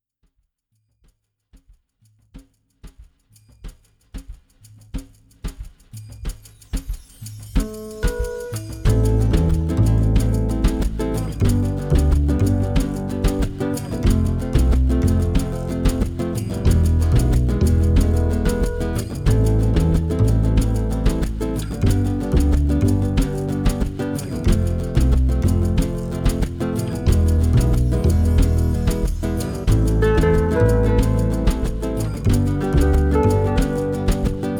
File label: flamenco vibes, 432 Hz